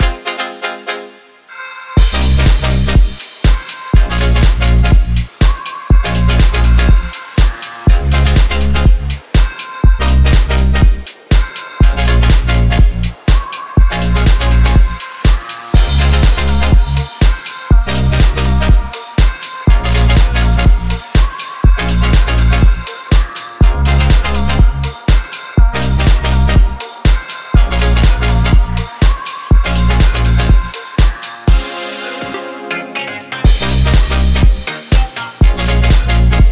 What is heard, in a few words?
summer dance pop